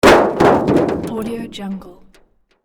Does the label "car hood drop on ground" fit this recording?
Yes